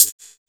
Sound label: HH CLOSED, Disco House; USB Soundscan vol.20; Fresh Disco House I; AKAI